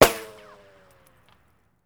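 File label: ricochet, weapons; Dystopia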